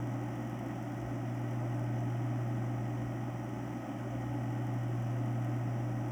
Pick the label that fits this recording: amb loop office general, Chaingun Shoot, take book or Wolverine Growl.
amb loop office general